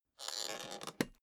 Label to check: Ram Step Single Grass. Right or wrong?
Wrong